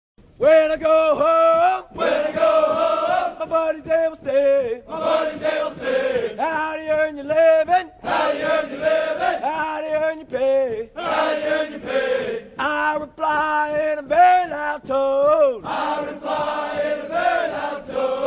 Navy Seal cadence; howd ya earn your livin

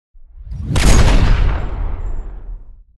BARRETT 50 CAL SLOW MO

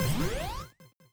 device connect

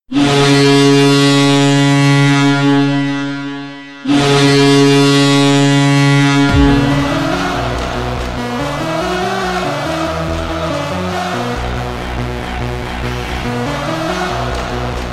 Horn

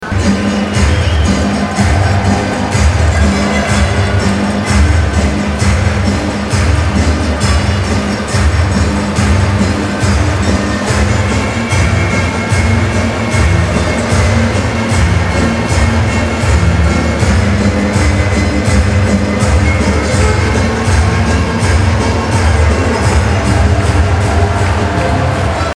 ORGAN Greecka tancovacka; OLYMPIC

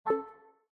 Windows Default

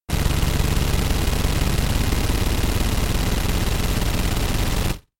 Automatic Gunfire

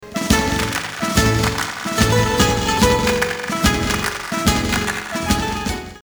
MEXICAN HATDANCE tada tada tatadadattatda

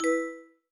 exclamation

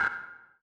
critical stop

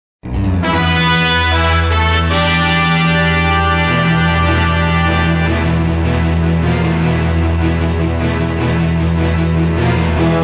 orchestra; mad max; hollywood pictures

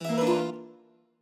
critical stop

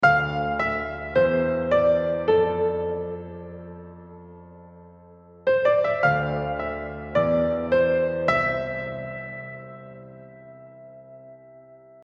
Chorused Piano

